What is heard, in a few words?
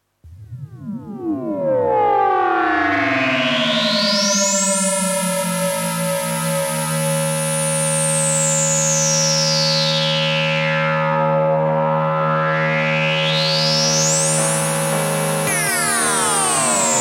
E korg monotron